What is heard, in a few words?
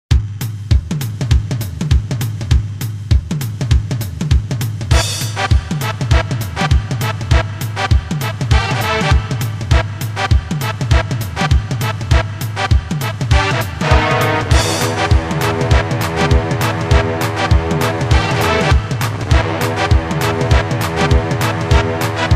Brass